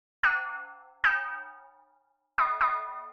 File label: Kling klang